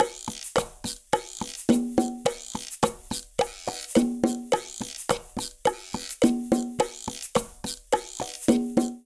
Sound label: Charanga Cha-cha-cha-106bpm, Latin Percussion; Ableton; Factory Packs